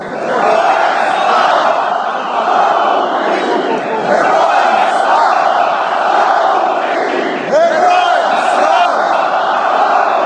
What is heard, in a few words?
ukraine chant